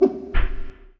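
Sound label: Attack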